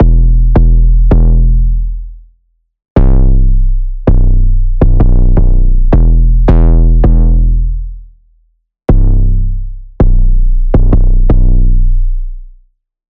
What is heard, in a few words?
808, Sylenth1; 808 - Training Regimen; Routed Sound; Sylenth1 Expansion - Street Vanquisher Vol.1